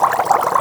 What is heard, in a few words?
Bubbles